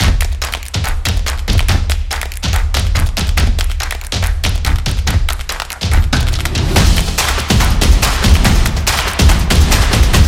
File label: A Percussion